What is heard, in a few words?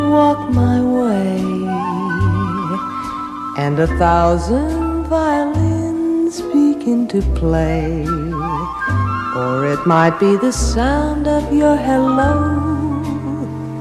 thousand violins